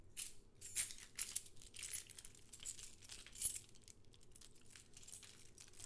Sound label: Keys Jingle